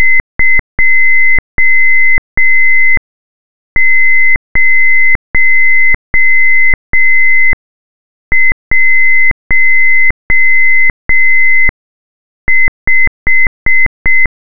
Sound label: morse